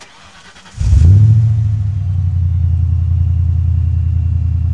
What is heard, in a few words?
Truck Explorer Start Loop; Trucks